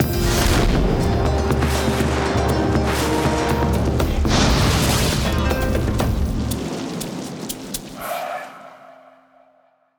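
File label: bigwin fire music sfx; DoubleTigersWD; wazdan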